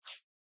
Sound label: swoosh Out